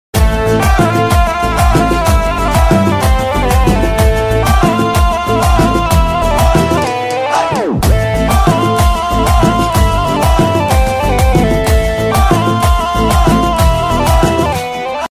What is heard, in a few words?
trailer bgm telugu bgm ringtone; tillu square